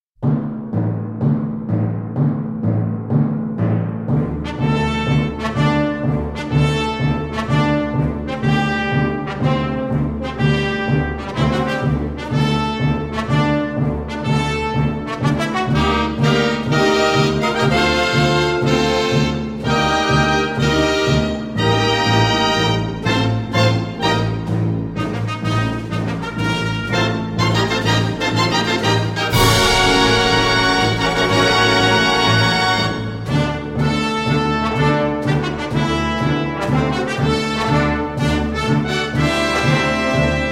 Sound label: Version For Symphonic Band; Tirant lo Blanc; I. Casament del Rei d'Anglaterra